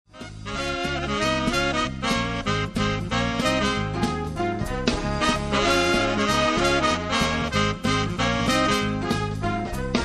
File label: big band medley